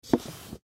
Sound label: Gift Box Pick Up; Pick Ups; Foley; Blastwave